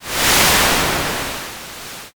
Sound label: wind